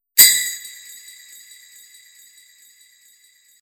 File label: Tree bell